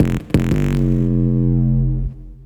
BASS LP, 175 BPM, Drum & Bass; Ueberschall; Drum & Bass Flash Trackz

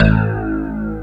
SYNTHBASS; East West - Global Sounds

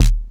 BD Dist, Bass Drum; Lost Tapes From Mars

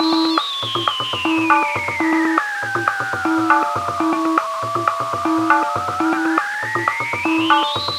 TABLAS; Zero-G; Chemical Beats

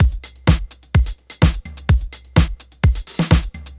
Drum Loops, 127 BPM; Sonic Foundry (Sony Creative Software); Underground UK House & Electro

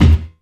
bass drum, drum & bass; Ueberschall; Drum & Bass Resonance

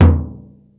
dohol L, Yamaha Motif ES drum machine; 300 Drum Machines; Yamaha Motif ES-Series

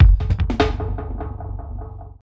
drbreak, drumbreaks; Masterbits; Vinyl Attack

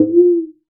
Conga Thumb; Roland BK-5; Percussion

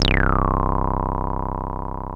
303 C1, TB 303

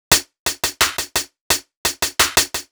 Hi-Hat Clap Combo, Hi-Hat Loops; KJ Sawka - Drum n Bass & Beyond; Drum Tool Box

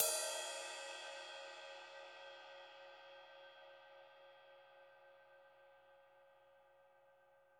CYM; Bob Clearmountain Drums I

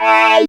syn, Dance Synths, Trancers; Trancers 1

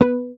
Bass, MUTES; Sampleheads; Will Lee Bass Library; HAMER12

